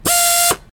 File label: Power Driver